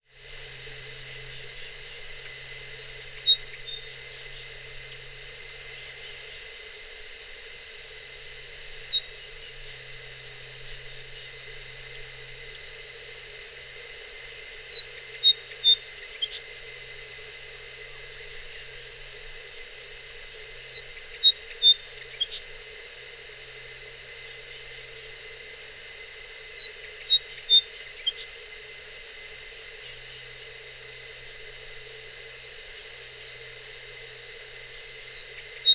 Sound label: Rhipidura Rufiventris Isura; Australian Northern Fantail; Call; Call 2